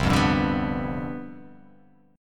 C Major 7th Suspended 2nd Suspended 4th, chord

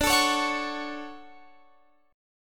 D Major 7th, Guitar, Open F; DM7 strummed

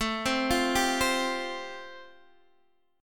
A-Minor 7th Sharp 5th, Guitar Open D, chord

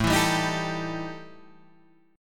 E Minor Major 7th Double Flat 5th

